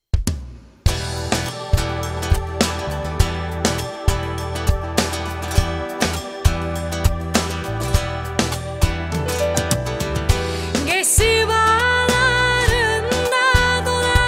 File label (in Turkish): pop akustik